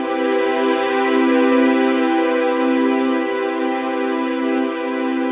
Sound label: String Waves; Сэмплы струнных инструментов (Легкая музыка)